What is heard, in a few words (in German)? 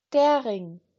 Ring